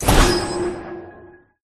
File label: Powercard Revive End; clubpenguin; cjsnow